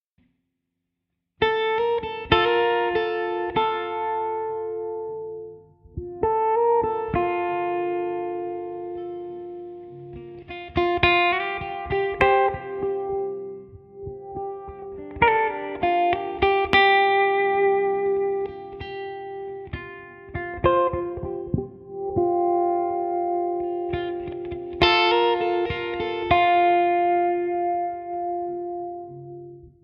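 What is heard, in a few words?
F 100 guitar cliche groove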